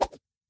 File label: lasso throw; toystory; jessie